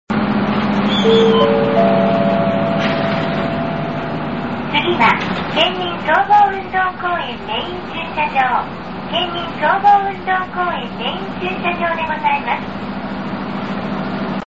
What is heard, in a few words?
main parking